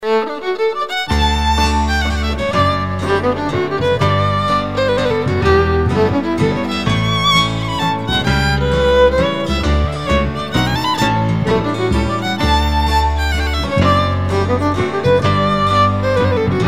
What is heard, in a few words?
Waltz